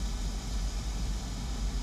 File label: Ambience Loop, outdoor